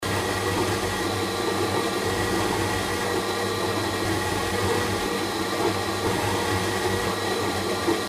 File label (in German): Smoothie 90cm Vergleich; blendtec 625